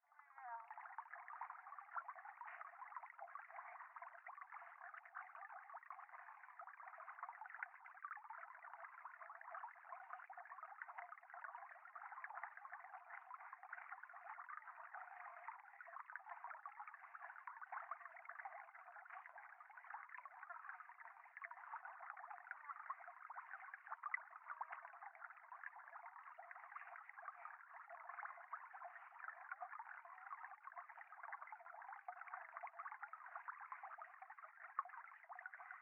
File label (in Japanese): 電話 フェラチオ 川のせせらぎ